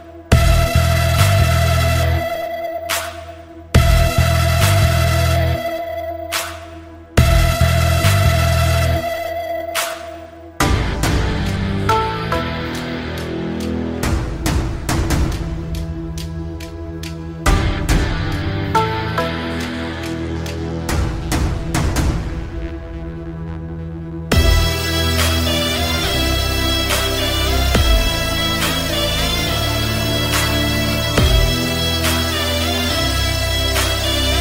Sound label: BGM Ringtone; Saaho